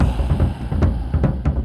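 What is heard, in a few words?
Music Shield; from dust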